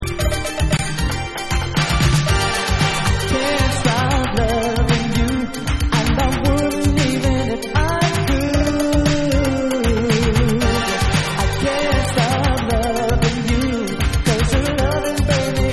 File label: Vocal Radio Version